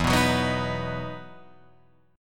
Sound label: E Major 7th Suspended 2nd, Guitar, Open F; EM7sus2 strummed